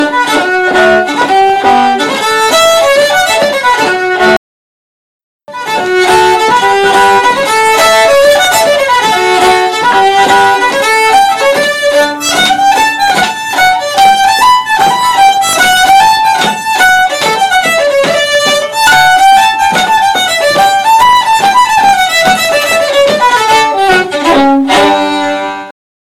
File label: fiddle; kirrie kebbuck